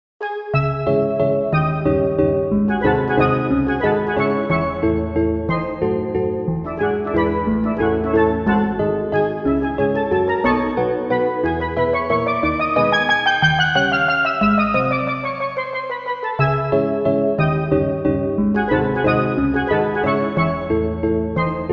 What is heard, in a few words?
Valse in C sharp minor; CHOPIN; Op 64 2